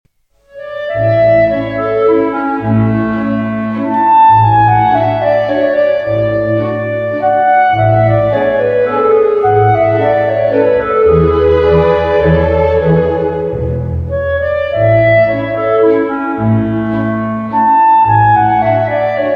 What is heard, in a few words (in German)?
klarinette